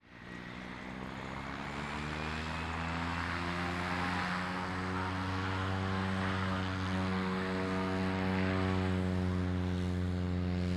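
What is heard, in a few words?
Cessna 208 - EXTERIOR - TAXI - Up Off; Caravan 1995; Pole Position Production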